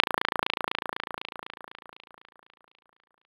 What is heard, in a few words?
FX RIBBIT; ModelCycles